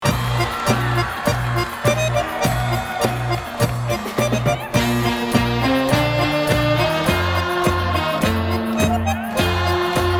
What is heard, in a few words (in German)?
blasmusik